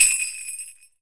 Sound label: SUZU, JAPAN PERC; AKAI S6000 CD-ROM; WorldSounds